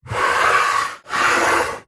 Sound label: foley wood block small x1 slide wood table